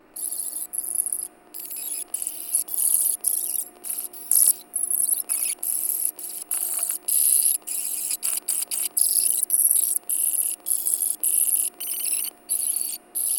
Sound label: Computing Industrial